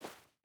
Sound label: footstep barefoot snow walk; Rust